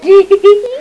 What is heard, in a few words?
D-he-he-he; Mac Concentration 1.8